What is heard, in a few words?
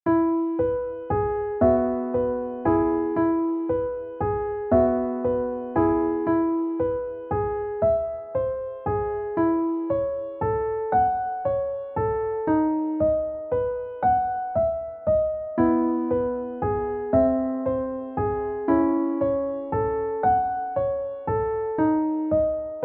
Lullaby (piano)